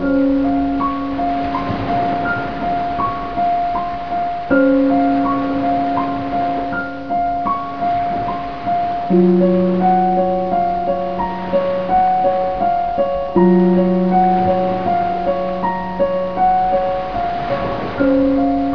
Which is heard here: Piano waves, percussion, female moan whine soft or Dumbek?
Piano waves